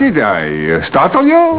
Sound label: startle; drac